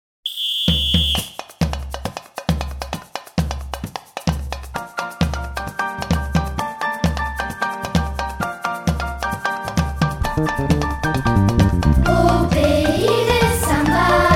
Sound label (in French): carnaval samba